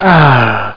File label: aah; spispopd